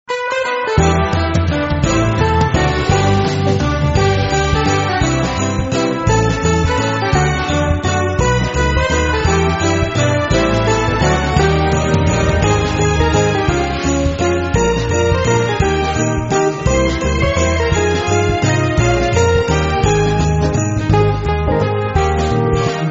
We Wish You a Merry Christmas (jazz classic) (Low)